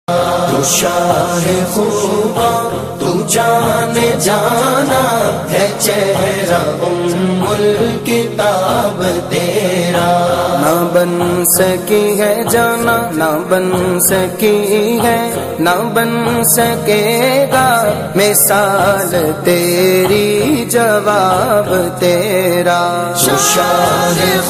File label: islamic ringtone